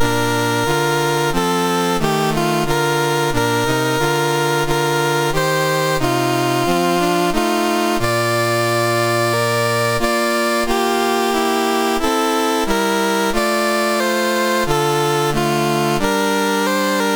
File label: MOS6581R3, National Anthems; Guyana